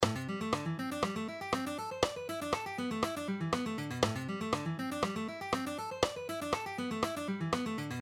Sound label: String Skipping Exercises, A Minor And E Minor Pentatonics; This String skipping lessons is on Aminor and Eminor pentatonics.; Frank Gamble’s Chop Builders